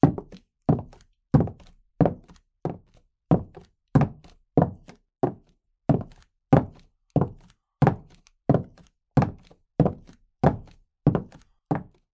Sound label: Footsteps Female - Stilleto Heels Wood Floor Slow